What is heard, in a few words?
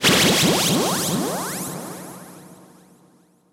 Downlifter